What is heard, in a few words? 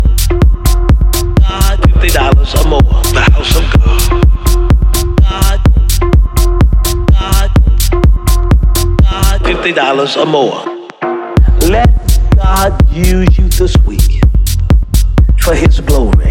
Dub Mix